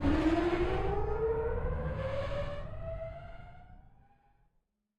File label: Player Conveyer Start